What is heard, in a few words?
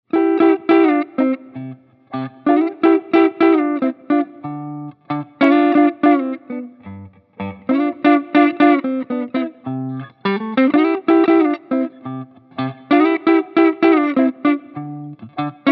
semi, guitars